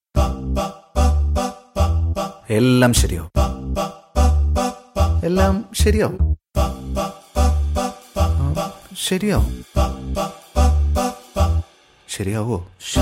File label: shaanthi bgm